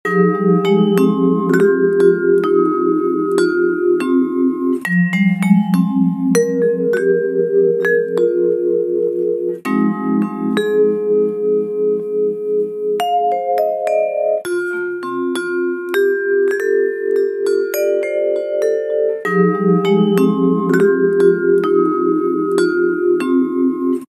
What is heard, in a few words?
lounge vibes, Ringtone; Apple